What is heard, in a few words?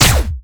fire, weapons; man melter; garrysmod; tf2